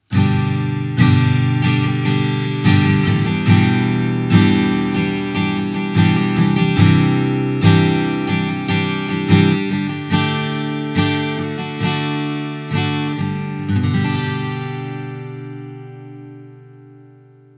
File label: STRUM